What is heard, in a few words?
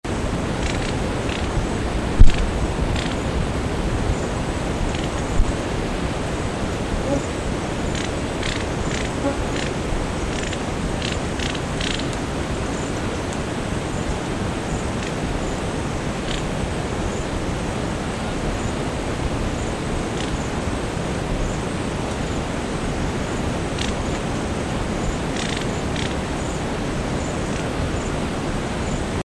apperts greenbul, Madagascar; Madagascar - 20th Oktober to 16th November 2003